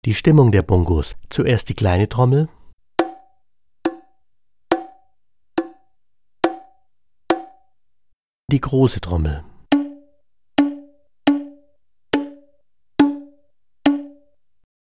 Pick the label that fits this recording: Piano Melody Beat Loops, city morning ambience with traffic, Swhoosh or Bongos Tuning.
Bongos Tuning